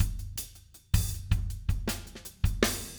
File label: 160 Nustyle Drums Intro; Very Fast Tempo; Pop Rock; Pro Tools Essential Loops; Digidesign